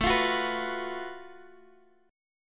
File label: Gb Minor Major 7th Double Flat 5th A, guitar